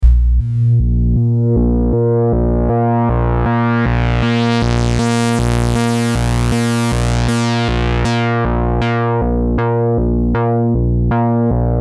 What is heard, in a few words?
Saw Lo Pass; tinysizer